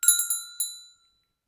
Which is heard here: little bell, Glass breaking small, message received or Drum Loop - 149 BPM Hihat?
little bell